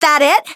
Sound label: damaged